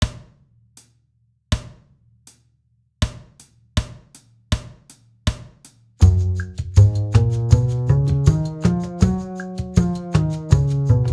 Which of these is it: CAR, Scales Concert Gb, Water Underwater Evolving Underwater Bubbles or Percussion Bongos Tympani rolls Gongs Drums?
Scales Concert Gb